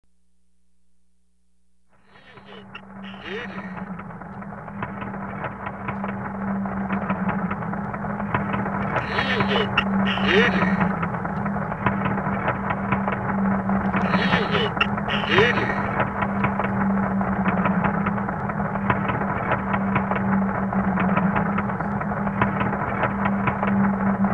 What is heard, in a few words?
fill; I believe it's a slowed down train sound plus a slowed down creepy doll. Or is it! Into a sick turkey gobble sample.